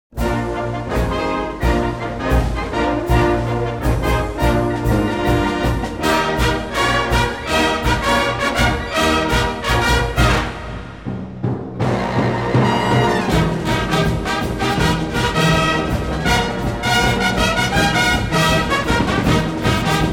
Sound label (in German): Fanfaren Sound